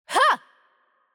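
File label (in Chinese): Attack Light, Chinese; bloom minigame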